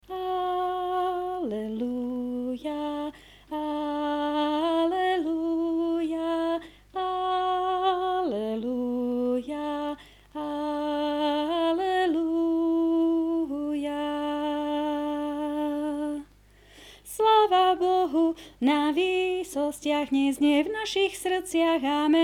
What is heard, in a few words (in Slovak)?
Sopran; Vianoce 2019 - Prišiel Ježiš; Farnosť Letanovce